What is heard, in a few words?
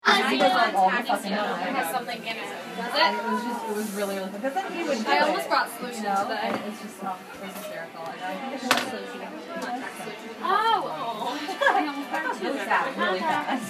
Dressing room; Dressing Room in the Playhouse; Field Recording #8